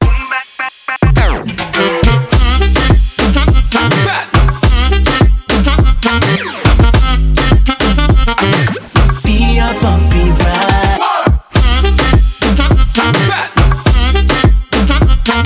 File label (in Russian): Отбивка